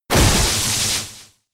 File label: Thunder Punch; Pokemon; Gen 5; Blk Wht Blk2 Wht2